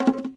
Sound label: jungle menu command; windows 9x; sound schemes